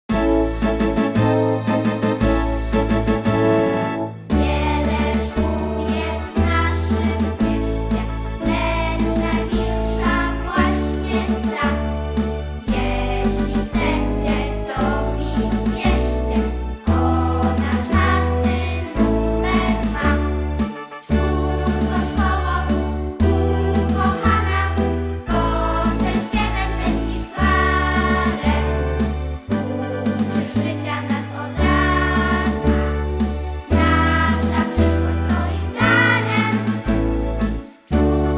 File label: hymn